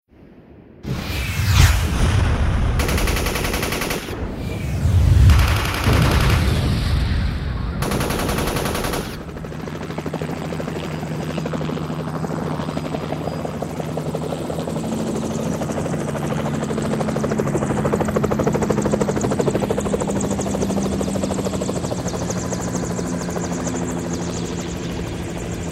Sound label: 3D Binaural SE